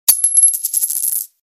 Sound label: Coin Sms